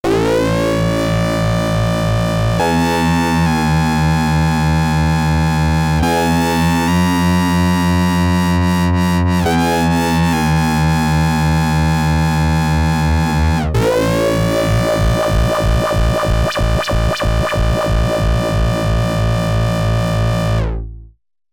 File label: Behringer K-2 MK-2 16 tri 4 saw OSC FM sync ENV filter